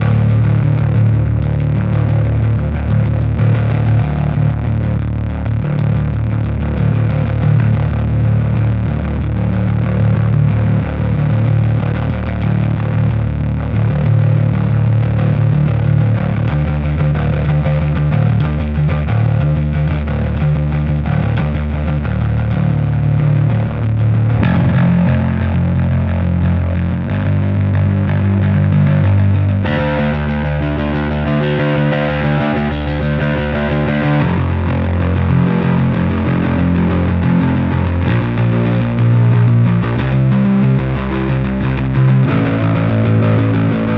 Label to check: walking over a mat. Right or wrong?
Wrong